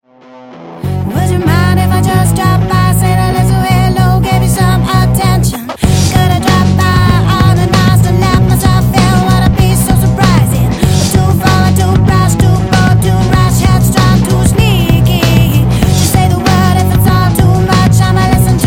fuzz

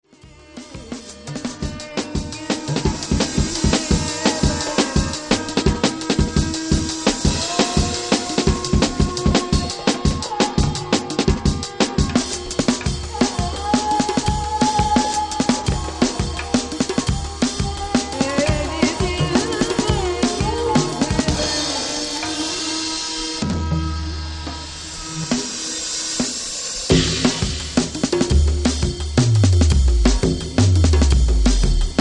Drum Funk

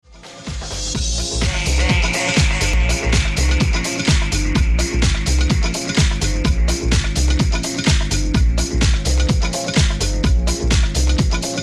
19c Trumpet Dub

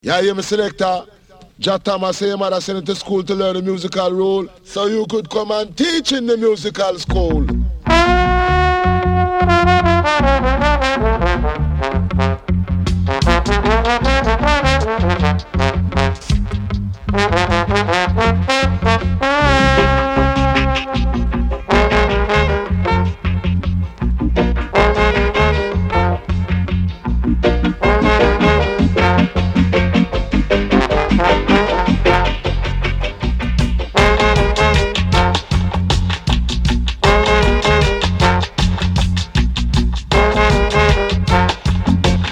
horns dub, horns

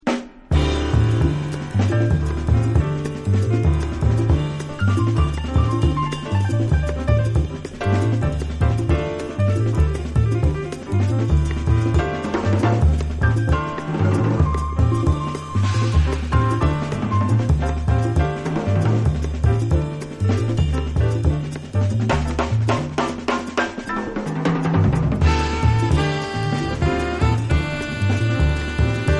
Black Classical Music

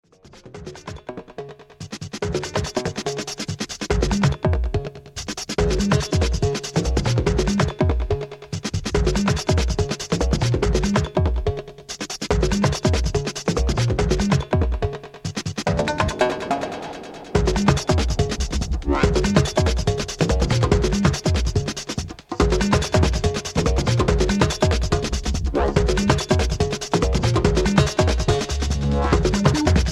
Metal Funk

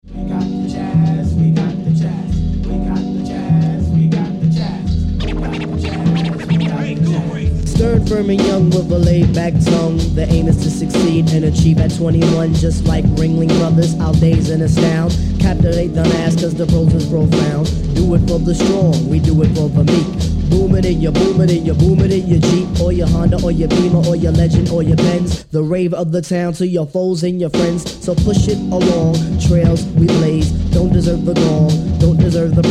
jazz

